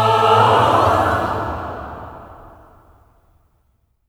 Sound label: OCT FALL, voices; OCT.FALLS